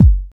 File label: clear kick sound g key